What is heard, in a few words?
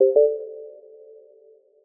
battery full; Linux; Celestial 2.1